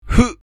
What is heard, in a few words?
jump